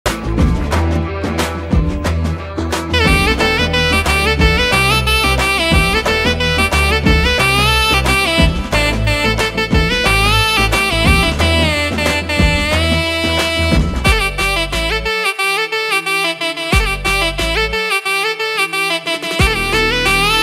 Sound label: Song Ringtone Instrumental